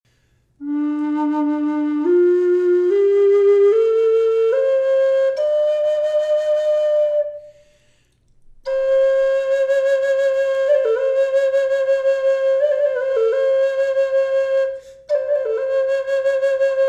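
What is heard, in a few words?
reverb, flute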